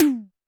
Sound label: TD-20 Tom A, Roland TD-20 Toms